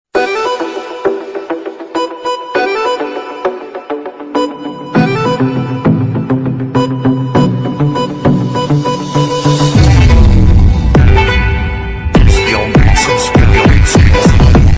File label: trap ringtone phone ringtone bass boosted ringtone bgm ringtones remix ringtone